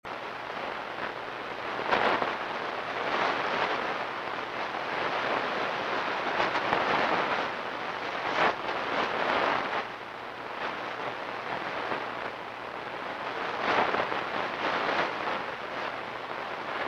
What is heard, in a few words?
crash